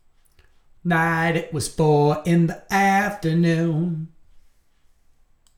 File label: vocals